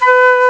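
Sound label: FLUTE; CMI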